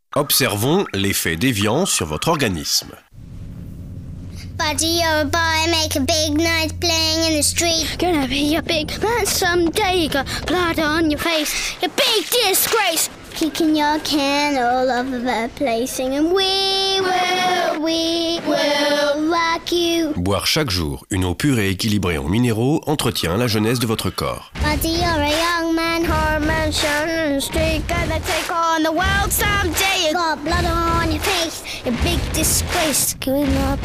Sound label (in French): bande son pub évian voix d'enfants